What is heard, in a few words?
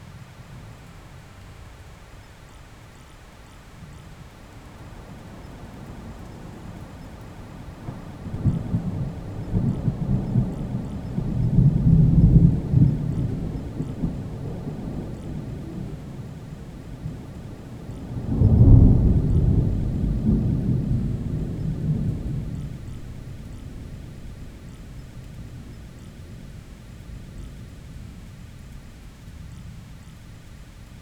heavy thunder in an open field